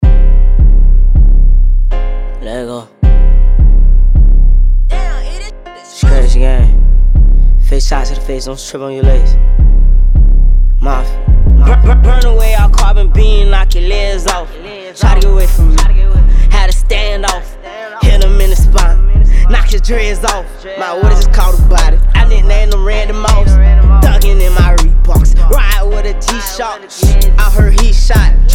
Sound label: beatbox